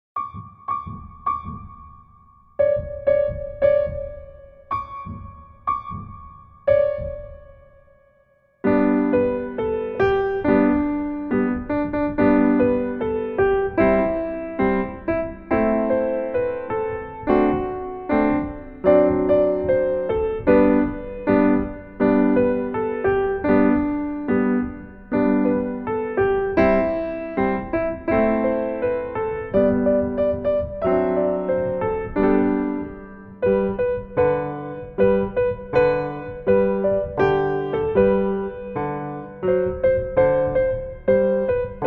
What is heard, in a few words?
Jingle Bells